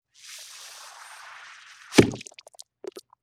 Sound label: Wet Squoosh Pop, Vintage Cartoon